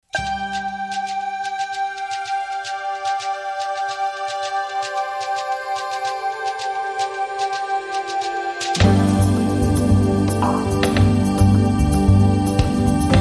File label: Rhythms